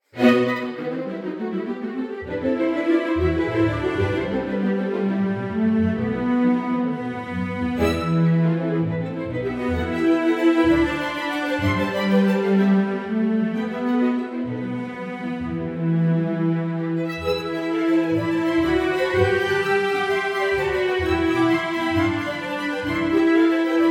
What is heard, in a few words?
Strings; Arensky; Var II; Musiversal